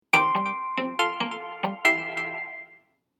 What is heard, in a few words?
test speaker mac